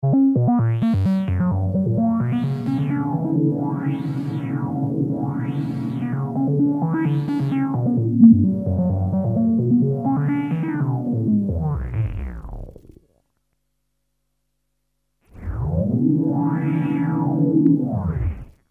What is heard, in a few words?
fx vco